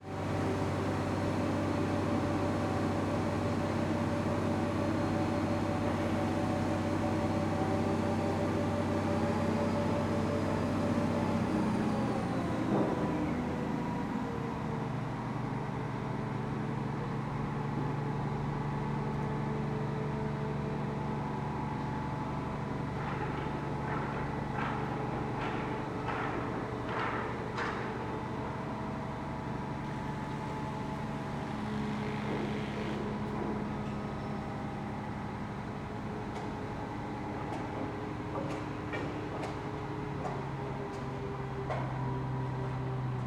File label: Amb, Construction, Ext, Crane, Hoisting, Various engine speeds; Hzandbits; Construction Ambiances